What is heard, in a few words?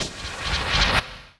Gold Lizard Arrow